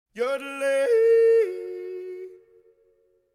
schweizer jodler; Auf dem Land

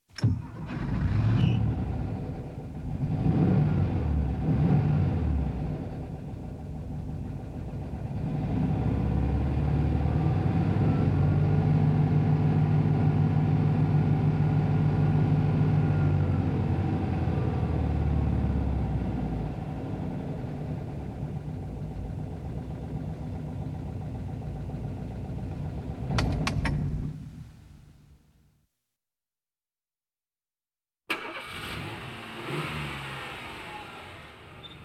volkswagen auto startet und fährt los; Auto-Zweiraeder

